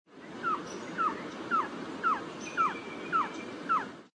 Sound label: Trogon mexicanus